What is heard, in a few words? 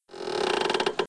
Boat Mill; Playable Tutorial Level